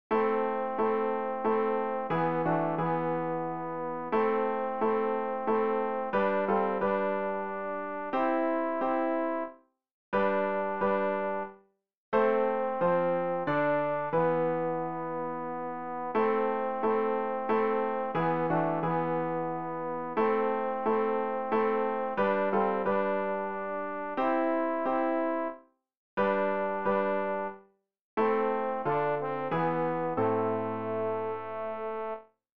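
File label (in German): tenor, Übehilfen; Ref. Gesangbuch; RG 073: Laudate Dominum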